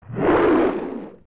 Big Moblin Swing Back; zelda; OOT

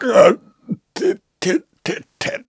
Rough Landing; feeling the rough landing; Groose; Skyward Sword

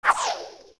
Sword Overhead; Overhead sword attack (also used for casting the fishing rod); OOT

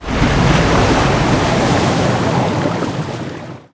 Pirate Ship Emerge; Zelda

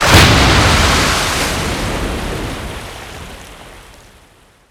Pirate Ship Land; Zelda

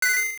Funny Joke; Zelda Oracle

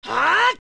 Strong Attack; Adult Link; Zelda; OOT

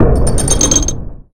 Guardian Creak

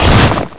Lava Block Sink; A lava block sinking

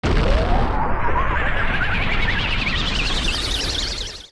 Fire Cast; Casting Din's Fire; zelda; OOT

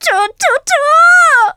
Stammer; Faux Hero stammering

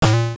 Skull Bounce; A pirate skull bouncing (heard in the Samasa Desert in OOS) (also stepping right in the Subrosian Dance); Zelda Oracle

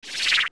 Stun; Baby Dodongo; zelda; OOT

